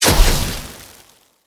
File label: frost storm; skyrim